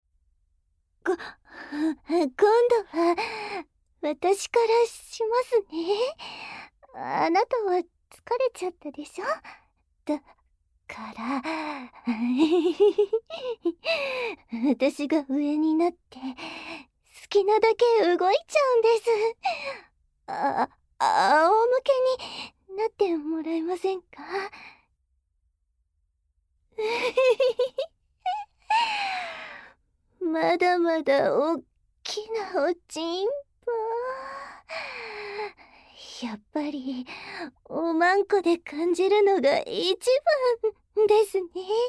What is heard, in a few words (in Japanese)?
耳舐め告白騎乗位; KU100